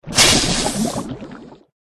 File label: murena attack voice up